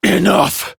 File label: Power Cast; Warframe; Entrati Lab Quest; 2023 TennoCon; Arthur